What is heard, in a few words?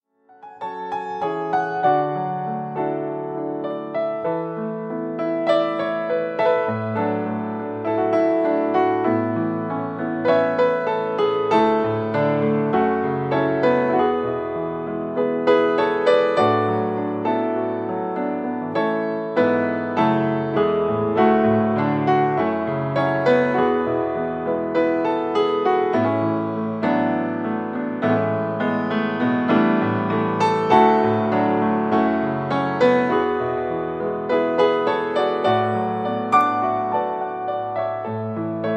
Instrumental